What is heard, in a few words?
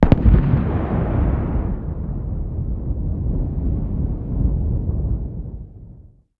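explosion distant; ModernWarfare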